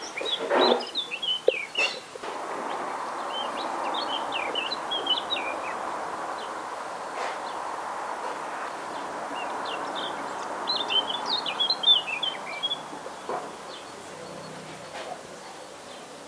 fauvette jardin; neuilly avenir

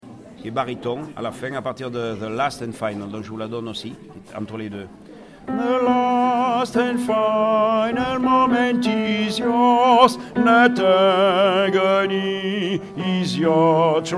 baryton

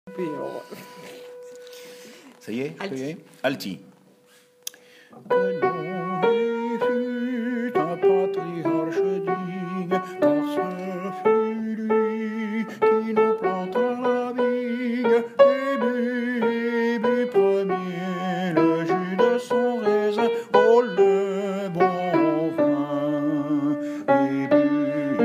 alto

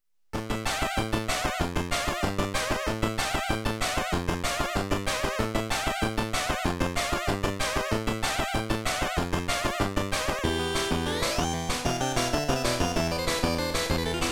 musiques boss niveau

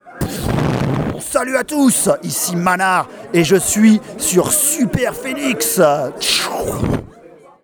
jingle

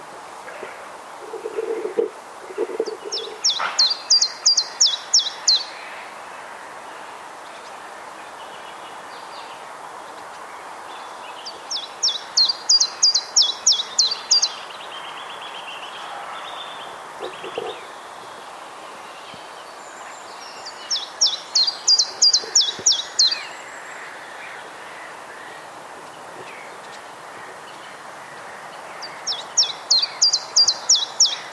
pouillot veloce sittelle

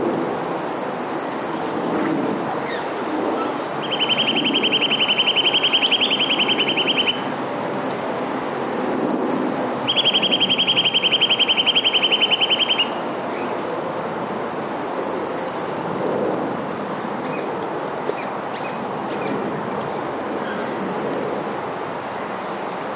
sittelle torchepot etang

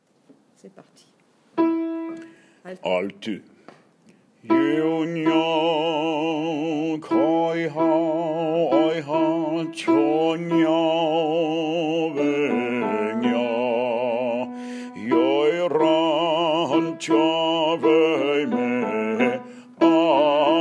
alto

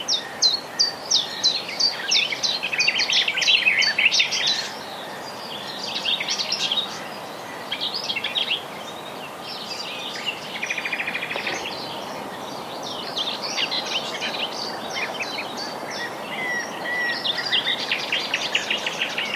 fauvette grisette rossignol

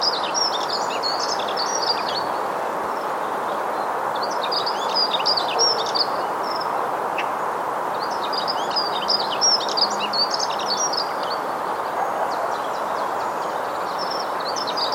accenteur mouchet

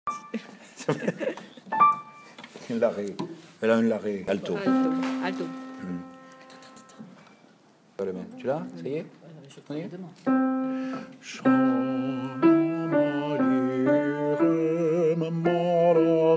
altos

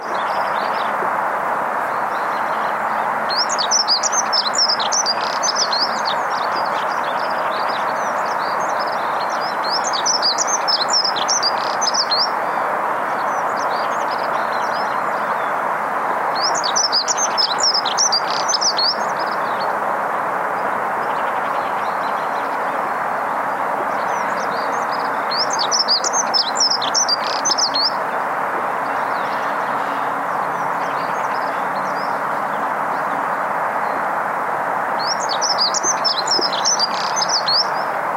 accenteurs mouchets

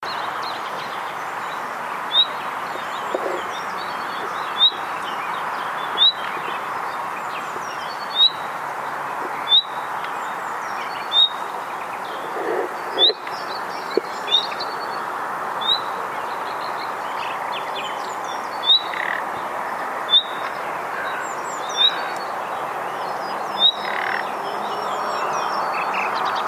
rossignol

